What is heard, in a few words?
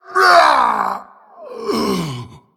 Male Tolvir Death